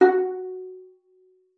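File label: violin pizzicato F#4; Xsample VOL-5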